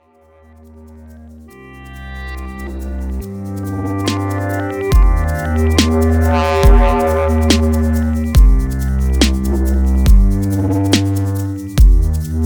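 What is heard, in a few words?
Dub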